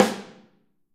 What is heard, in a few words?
Snare OH; Snare OH All; Drums Percussion